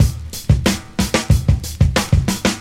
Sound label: 92 bpm breakbeat b key, Free drum loop sample - kick tuned to the B note. Loudest frequency: 1404Hz